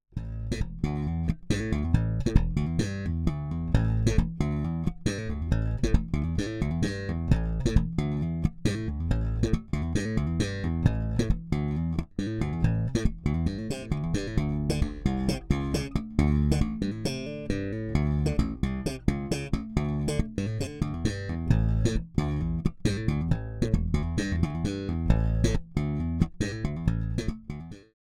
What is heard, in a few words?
Precision Bass Both Pickups aktiv Low boost High boost; Fender Troy Sanders